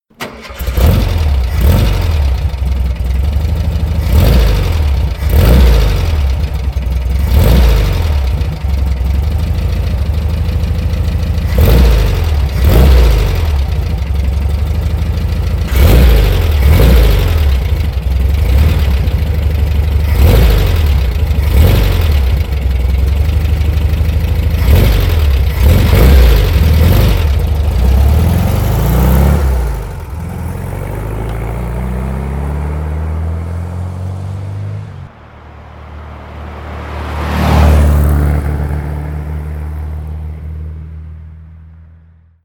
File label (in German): buggy auspuff; typ cs